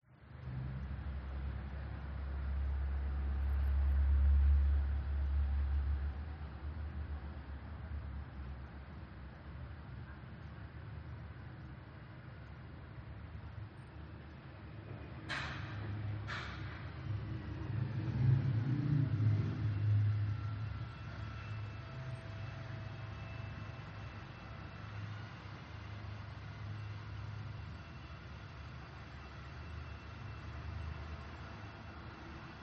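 Room Tone Apartment 2nd Floor Traffic Construction; PMSFX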